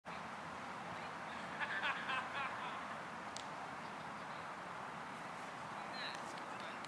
outside laugh

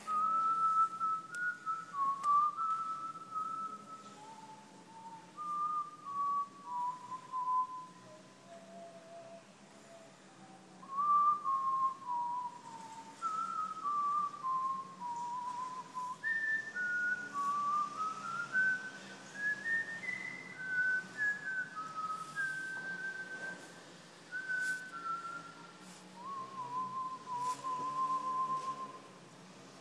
whistling hymn in church; upton church

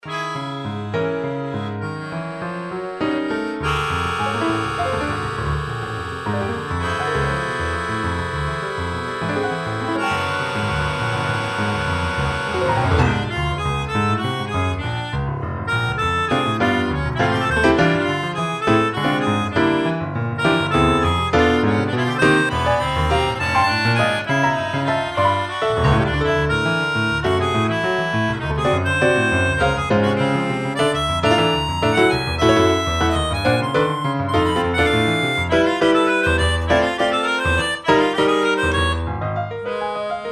acoustic version

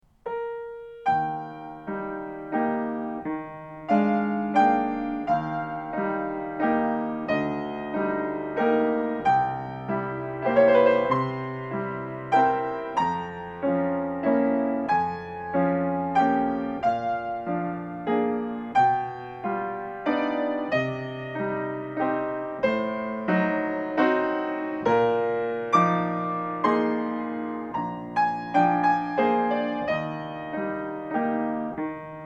piano practice Chopin nocturne in E flat major; lockdown; op 9 no 2

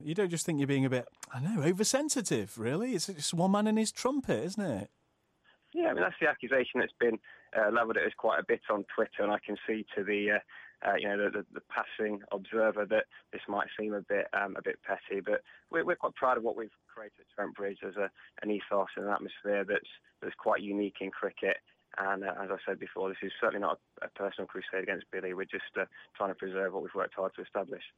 trumpet

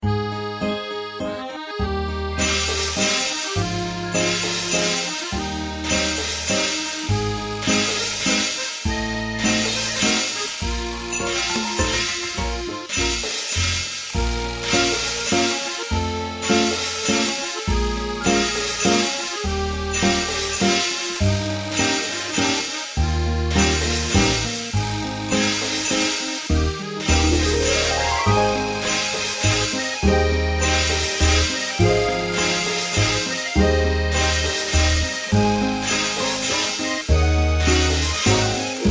3:4 Waltz

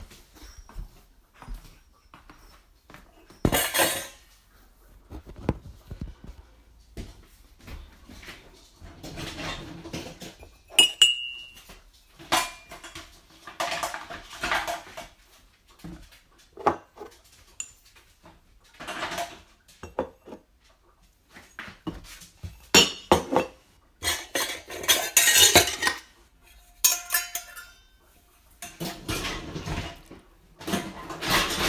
kitchen soundscape

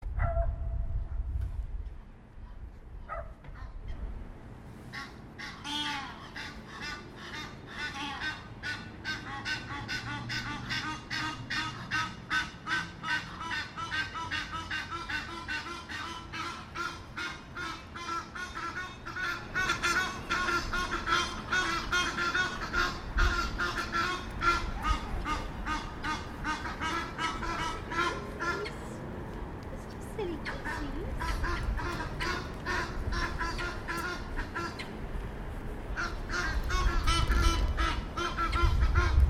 excitable thames birds

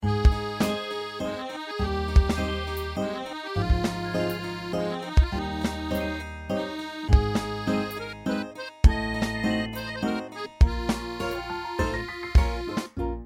3/4 waltz

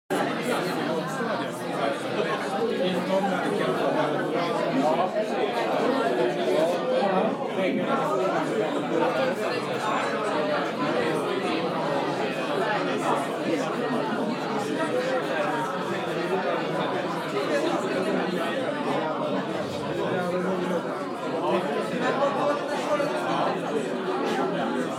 noisy restaurant

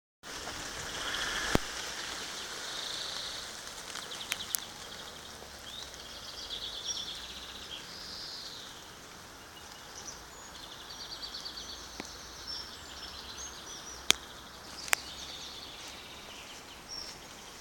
dawn chorus

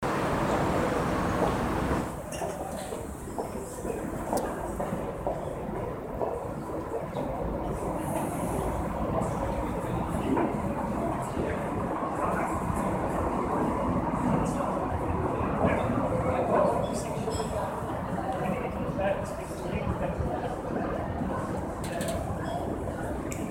five minutes of early evening street ambience

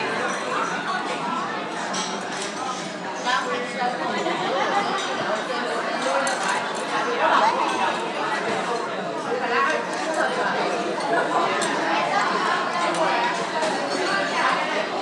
ambience 30 seconds of din tai fung

